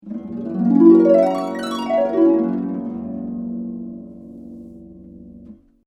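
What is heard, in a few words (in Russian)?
арфа переливы вверх и вниз русская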